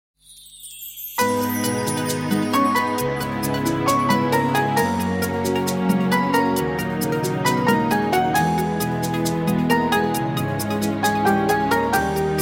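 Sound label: ringtone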